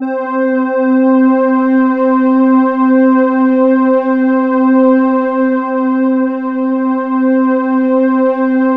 square, synth line; 90 s Sample CDs; NiceBeats; SynthLine VOL-2

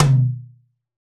TR-707 Tom 6, Roland BK-5 Toms

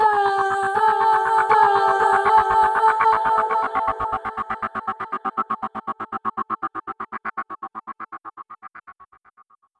Gate Compression; Sonic Foundry (Sony Creative Software); Pocket Diva World Class Vocal Samples

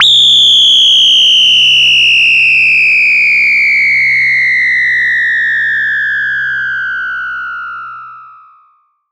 FX, EMS VCS3

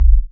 kik, one shots, dance; Sonic Foundry (Sony Creative Software) - Future Retro Dance Excursions

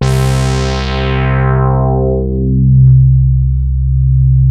LEADSWEEP, BASS STATION; Syntec; Wall of Sounds

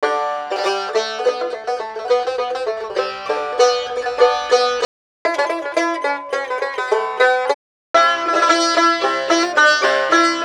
Jingle bells

